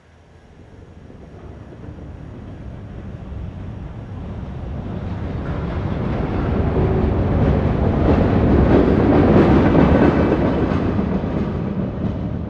train distant pass

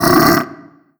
pain, barnacle; Half Life Alyx

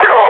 pain, combine grunt

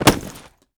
body impact; physics

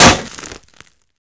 metal barrel sand impact bullet; physics

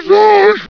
zombie voice idle; Half Life 2

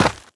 step run, footsteps, gravel; player

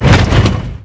sled; ambient; Half Life 2; Episode 2; outland

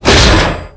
metal box break; physics

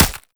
flesh npc, bullet impacts; physics; Half Life Model Pack

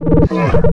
dog phrase; npc; Half Life 2; Episode 1

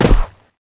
land sand, footsteps; player

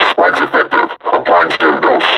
injured, suppressor

